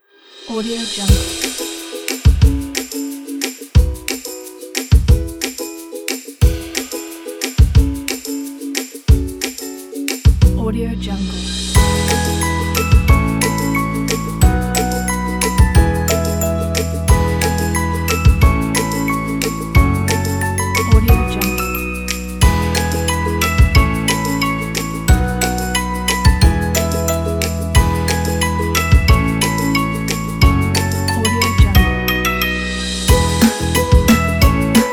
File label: happy playground; brownbird